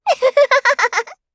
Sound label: laugh; Eternal Return; Garnet; KOR